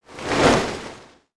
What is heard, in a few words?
Flap Sweetener; creatures organic